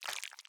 slide, block, honey block; Minecraft

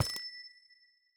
step, block, amethyst; Minecraft

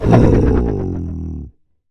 stun, mob, ravager; Minecraft

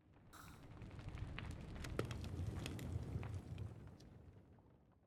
smoker; Minecraft

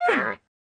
panda cant breed; Minecraft

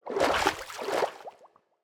swim, liquid; Minecraft

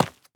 break, tuff; Minecraft

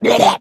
shulker ambient; entity; Minecraft

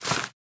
climb, vine; Minecraft

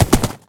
horse gallop; mob

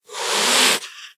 hiss, cat; mob; Minecraft

